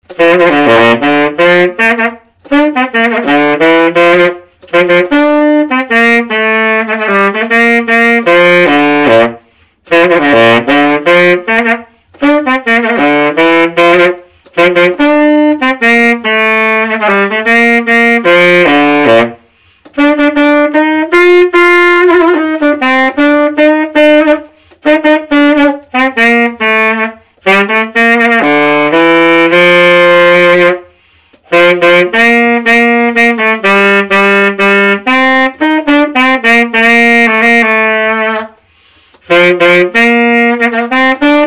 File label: Conn Wurlitzer Tenor Silver Cannon